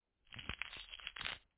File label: gore flesh skinning flesh